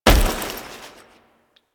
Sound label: Foley Weapon Fire; Warframe; Kahl